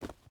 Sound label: footstep boots forest run; Rust